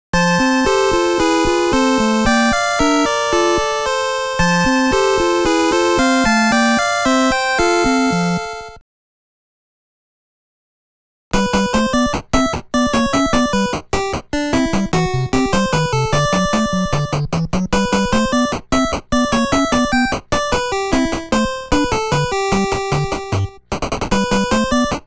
オープニング ／ メインテーマ ／ ステージクリア ／ レベル25クリア

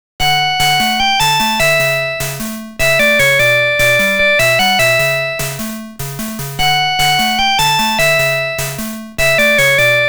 メインテーマ

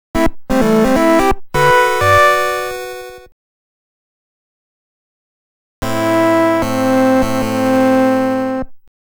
正解 ／ 不正解

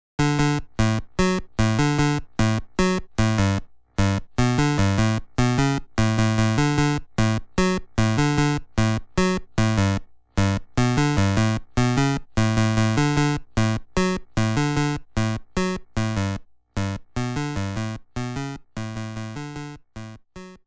メインテーマ ／ パズル完成

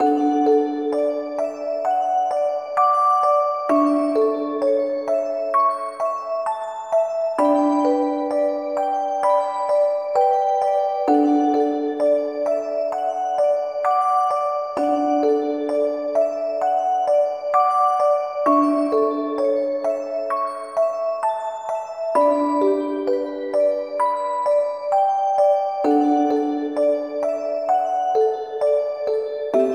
風のオルゴール; Fuuraiki 2; PS2

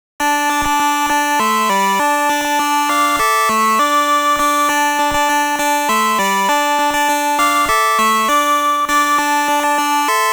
フィールド2

